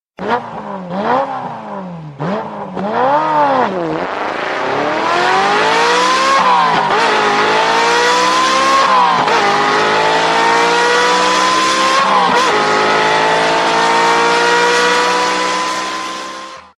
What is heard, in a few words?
Engine Car Sound